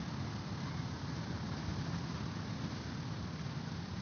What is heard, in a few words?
Ambience Loop, outdoor